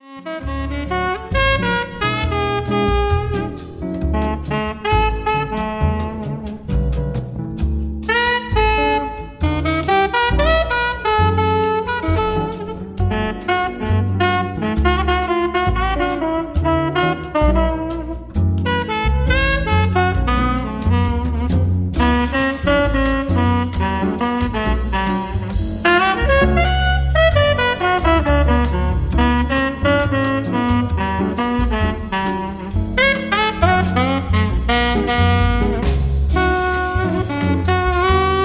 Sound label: nocturnal jazz melodies